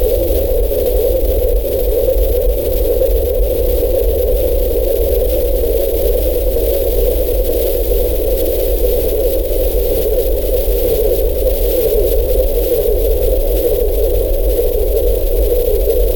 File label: warbling